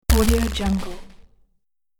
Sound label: robot electric laser hit